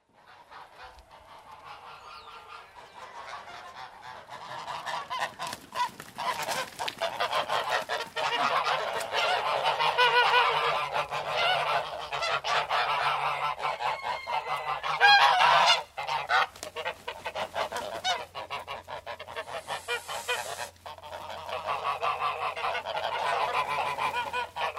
гуси выходят из птичника

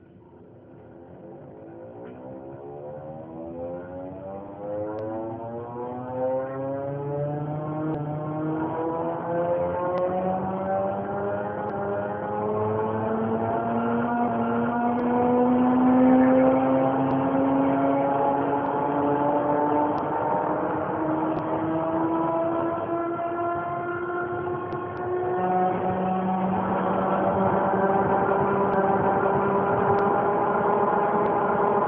zvuk elektropoezda

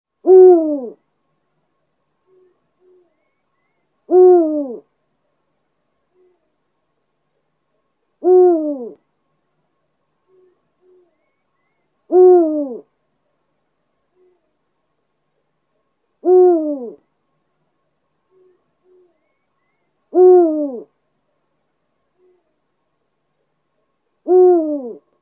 Filin - krik filina

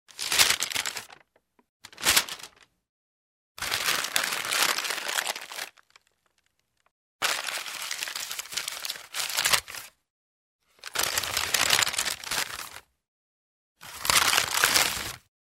shoroh bumazhnogo paketa

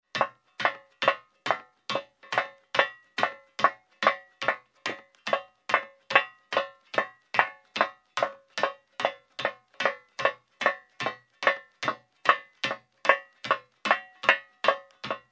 beg po metallu na kablukah

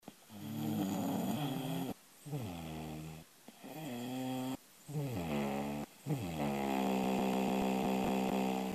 tihij hrap

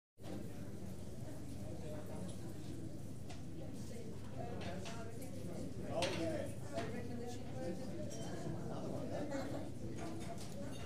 zvuki v grimerke teatra

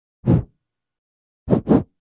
dujut v mikrofon

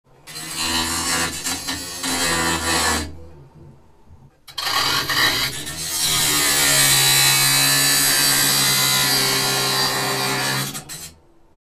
zvuk rabotajuschej bolgarki

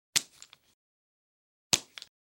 zvuk katjaschegosja karandasha

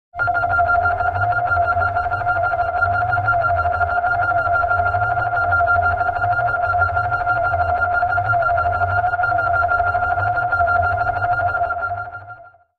zvuk trevogi na kosmicheskom korable

